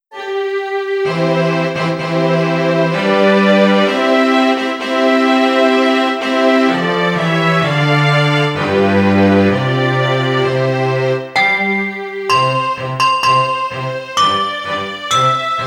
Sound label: Handbells; O, Christmas Tree